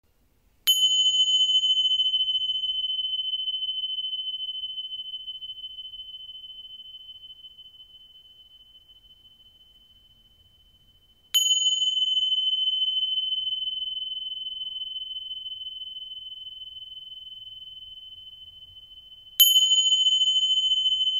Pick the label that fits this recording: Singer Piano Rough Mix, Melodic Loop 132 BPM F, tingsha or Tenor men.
tingsha